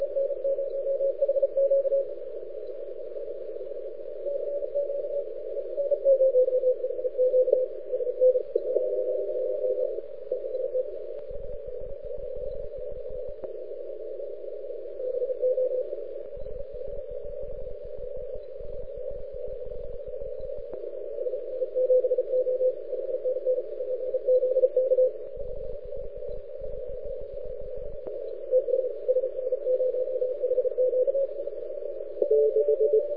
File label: CW, 14MHz; SN0HQ de SN7O; IARU